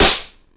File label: pop; sasha